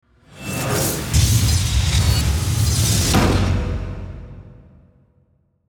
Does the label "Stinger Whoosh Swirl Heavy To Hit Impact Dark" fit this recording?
Yes